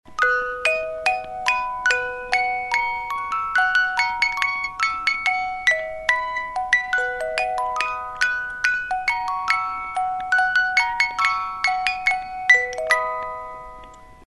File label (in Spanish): juguetes juguete